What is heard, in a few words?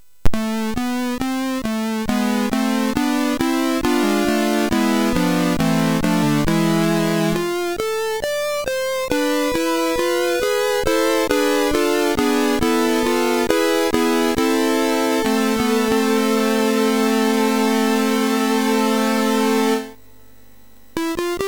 Classical, MOS6581